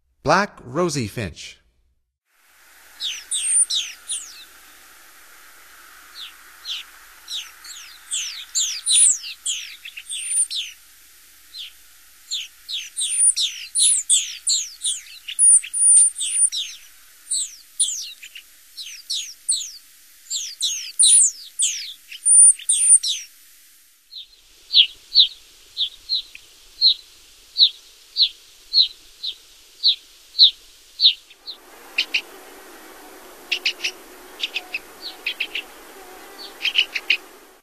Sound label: Black Rosy-Finch, Songs of Birds of North America; Stokes